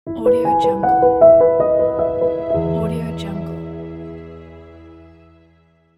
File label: inspiring piano logo; musicalsmile